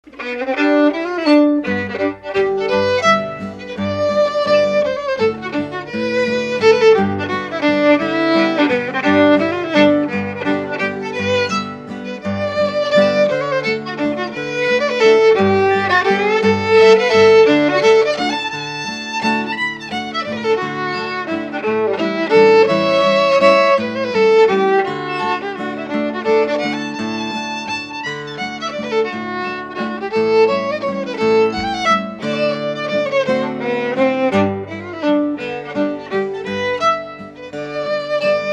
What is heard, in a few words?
Waltz